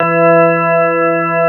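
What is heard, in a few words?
CX3 organ E3 L, Keyboards Old School; USB Soundscan vol.09; AKAI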